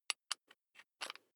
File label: electric drill bit fasten wrench apply